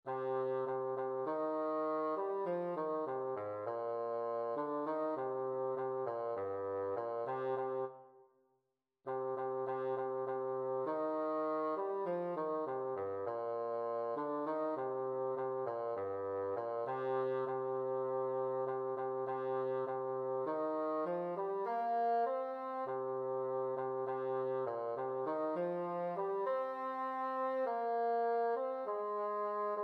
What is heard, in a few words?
bassoon